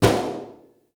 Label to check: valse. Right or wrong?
Wrong